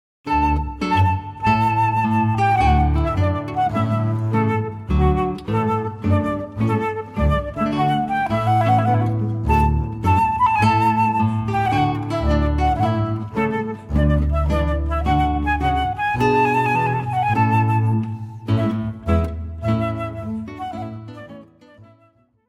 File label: Trumpet Tune, guitar flute bass trio